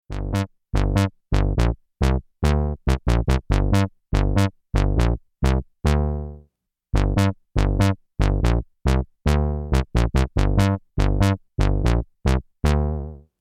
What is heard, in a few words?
That Bass, Poly800